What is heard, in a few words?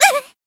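Voice damaged, Japanese; tsukiha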